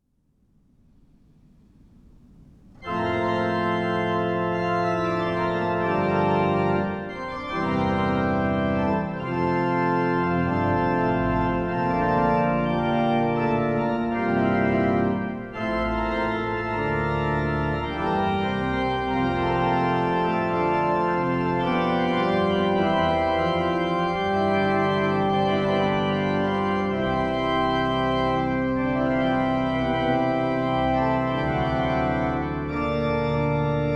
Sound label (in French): Plain Jeu